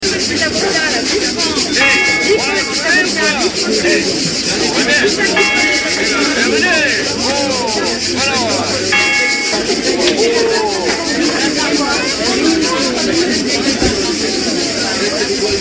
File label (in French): MARCHE